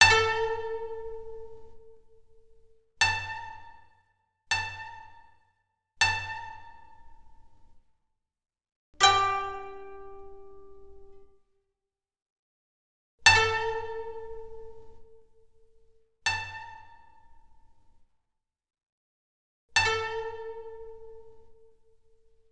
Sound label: Koto Lullaby; fatal frame 20th anniversary celebration soundtrack